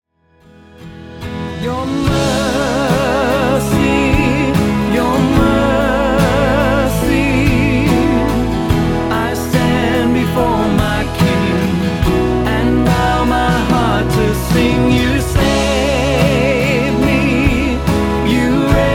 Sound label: Contemporary Service Music